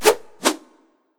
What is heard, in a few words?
Skill Ninja Mooves